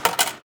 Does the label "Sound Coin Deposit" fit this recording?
Yes